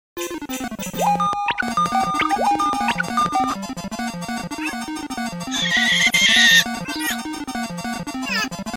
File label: skype